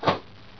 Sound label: slime death; the-hat-chooses-the-wizard